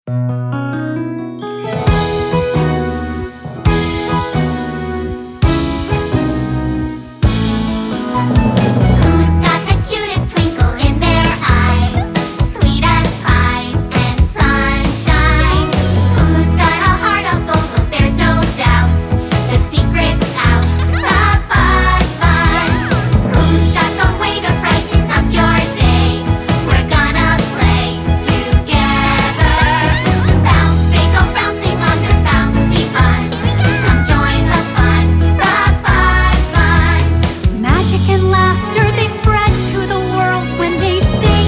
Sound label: Theme; FuzzBunz